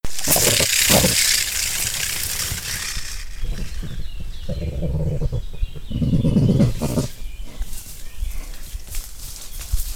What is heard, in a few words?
verso istrice hystrix cristata stachelswein puercospin crestado crested porcupine